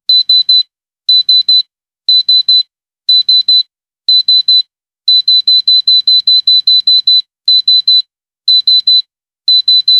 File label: sound the defibrillator, medical equipment